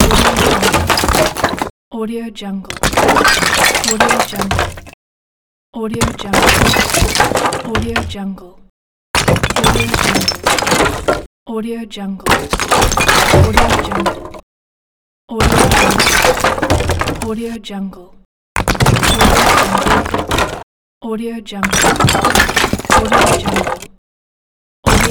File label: falling rubble short pack